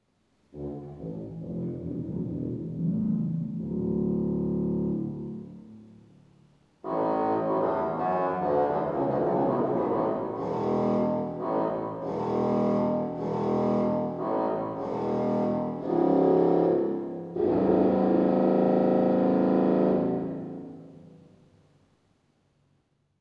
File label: 32 reeds, For those folk who like to hear bottom notes of 32' reeds.; phoenix; organ